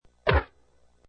Punch Quick, A single quick punch to the body or face.; Punches and Hits; Combat